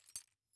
Tab On Table; This Is the Police 2

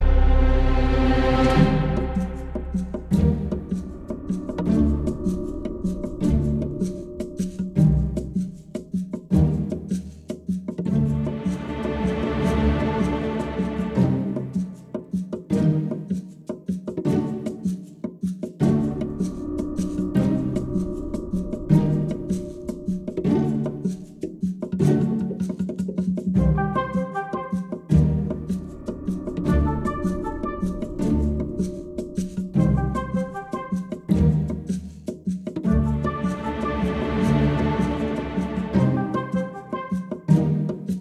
Wilikin Railcar Station (Indoors)

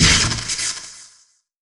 Electro Bolt Shoot X2

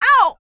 pain; calliope; Idle Champions of the Forgotten Realms

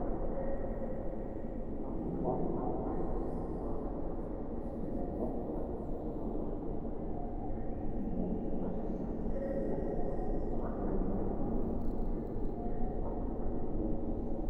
amb underswamp

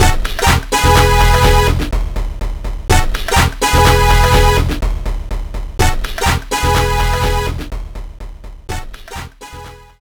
Bowling - Pins Clear; Super Monkey Ball Jr.; GBA

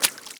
walk water

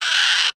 pain

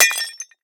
Pot Break Small; angry birds rio

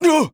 damage taken aerial

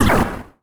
Bolt Shoot; Smith

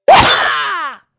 red bird yell

